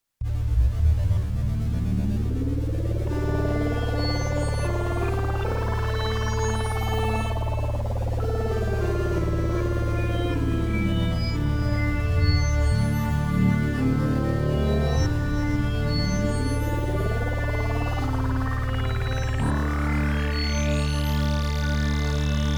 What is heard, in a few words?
Twisted Shuffle; hyper terrestrial